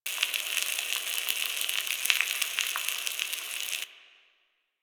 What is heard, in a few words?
Infested Bulb Sprites Loop; Ambience; Props; Warframe; Corpus Infested